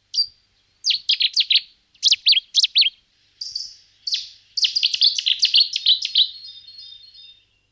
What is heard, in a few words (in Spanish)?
Ruisenor bastardo, pajaros